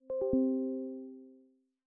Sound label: New Message Notification; Microsoft; Windows 10x